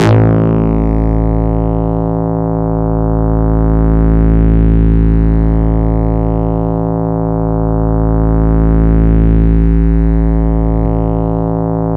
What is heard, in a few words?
AnalogBass a2, Yamaha YX81Z